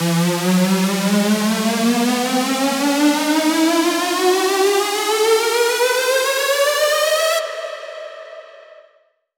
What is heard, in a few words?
Square E 4 Bars